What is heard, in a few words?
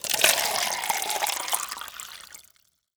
Liquid Pour into Cup